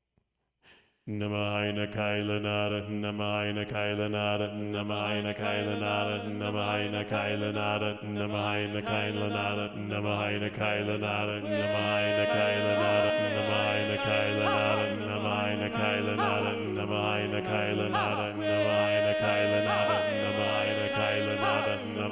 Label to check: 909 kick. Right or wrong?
Wrong